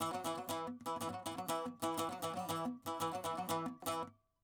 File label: saz